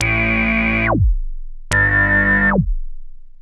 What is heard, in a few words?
moogli